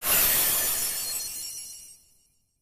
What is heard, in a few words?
magic chant